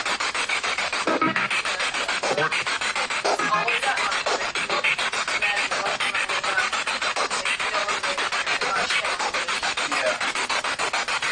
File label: Living Room Spirit Box